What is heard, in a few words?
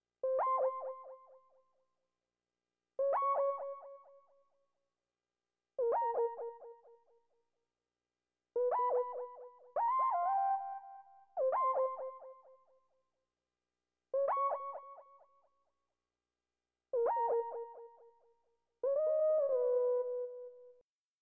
LEAD